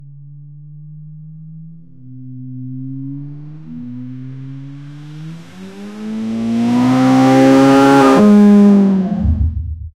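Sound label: gt3 car cinematic background, trailer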